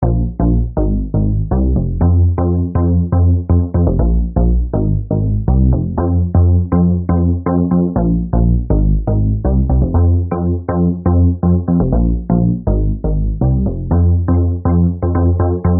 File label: music